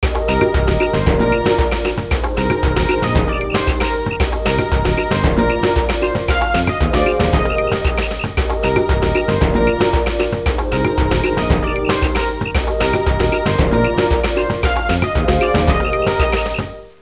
suonerie contemporanea; musica contemporary

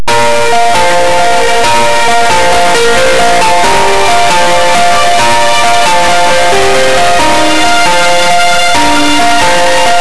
musica newage

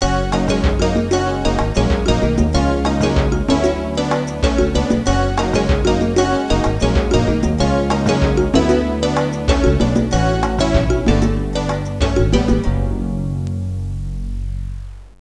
musica traditional est; fareast

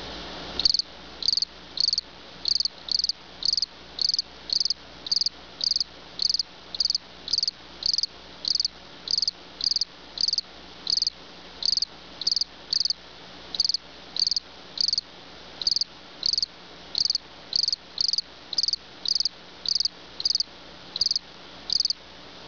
insetti insect; animali

